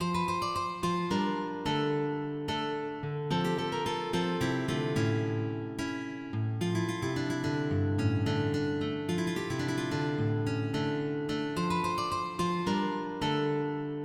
Basse dance, MIDI

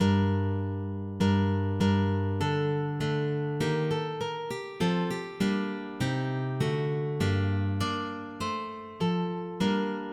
Praeambulum, MIDI

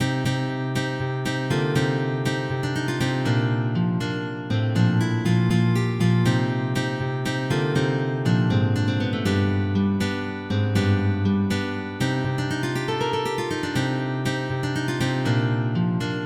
Padoana, MIDI; la sva

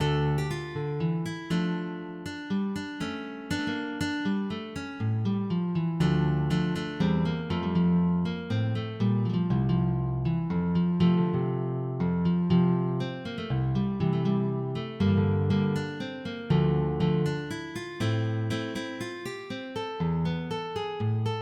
Sarabande, MIDI

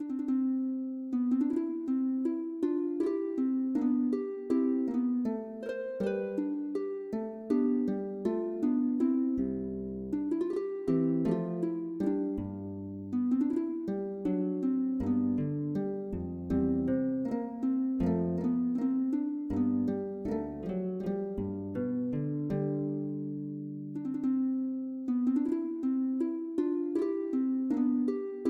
Guigue, MIDI; Gallot

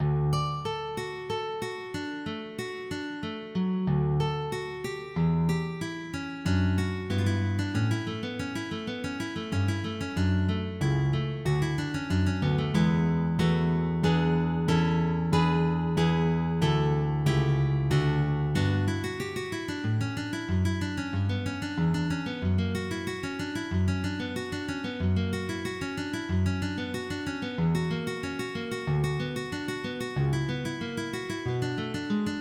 Prélude Ré Majeur

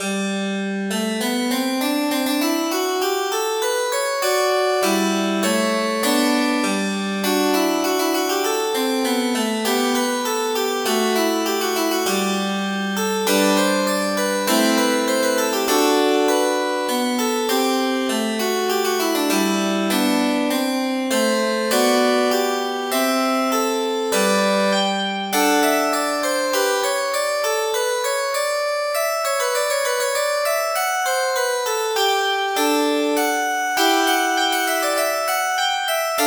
Fantasia del quinto tono, MIDI

